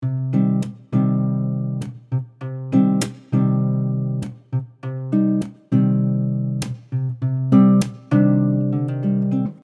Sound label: Percussive Cmin 100bpm, Soft Nylon Guitar; Percussive Patterns; MG Soft Nylon Guitar - MIDI Pack